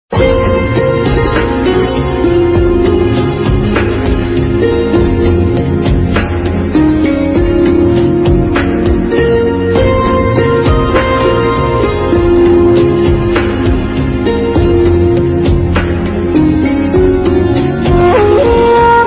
Heart Touching Instrumental Ringtone; ringtones

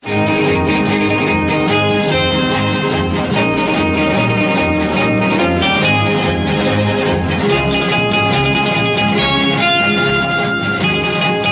Instrumental ringtone

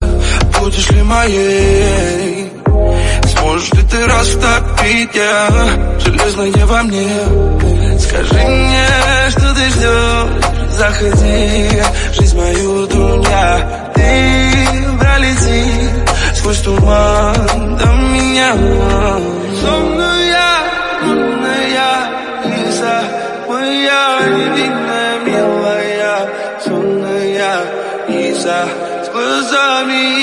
Arabic Song Ringtone

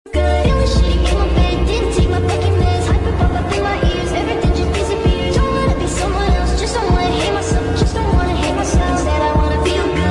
English Song Ringtone

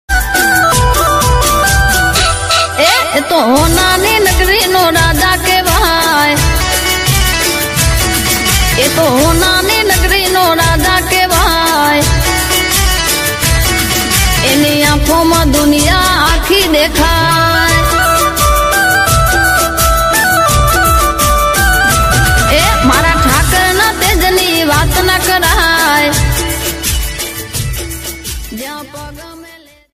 Gujarati Song Ringtone

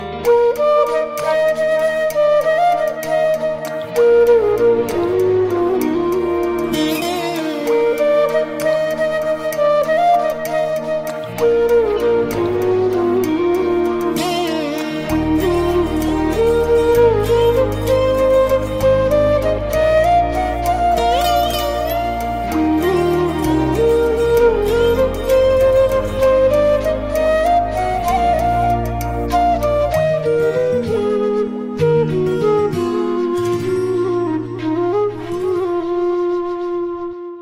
Instrumental Flute Ringtone; Viswasam

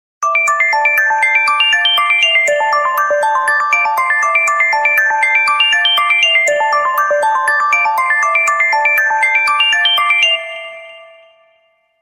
Apple iPhone 6s Ringtone; ringtones

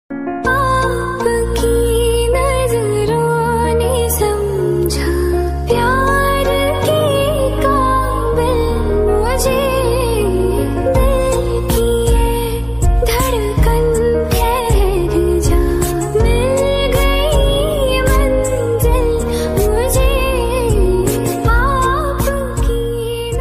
Female Version Ringtone

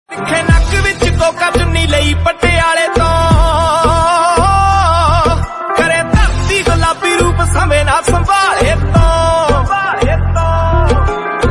Punjabi Ringtone

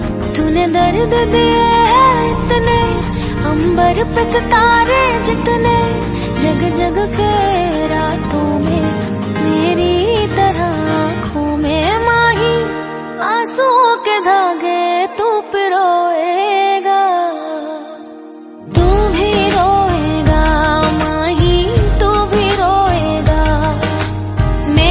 Sad Ringtone

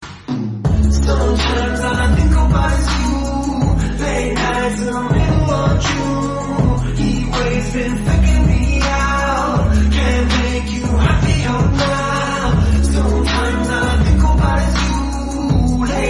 Song Ringtone

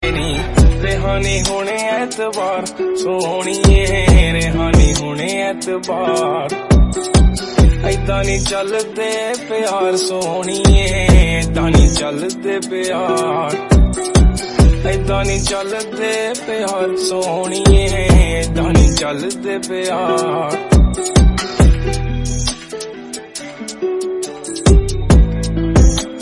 Punjabi Song Ringtone

